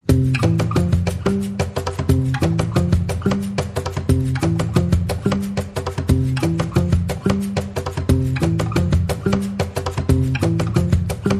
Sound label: No Kalimba